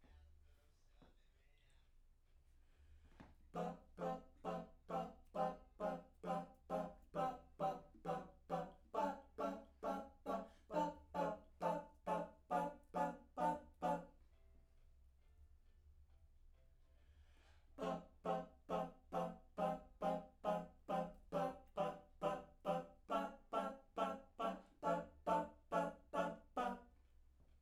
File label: Backs; SCHOEPS